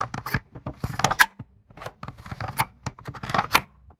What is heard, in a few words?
unscrewing a plastic gas cap; machines